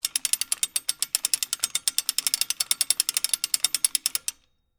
Hand Crank Wire, Hand, Crank, Wire, Rig, Long, Theatre, Stage; Foley; Film Sets